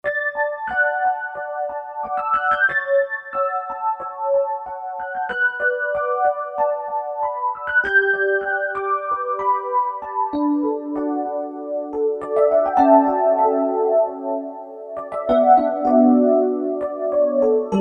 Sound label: SOFT E.PIANO, Roland SC-880; Factory Patches